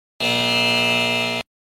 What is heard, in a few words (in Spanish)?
Efecto de sonido Error